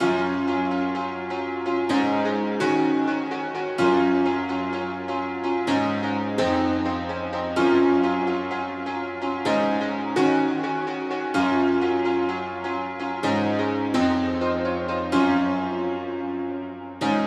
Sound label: 127 BPM G# Min Piano, Euphoria; Melodies; Cymatics; GHOST (Beta Pack); Allured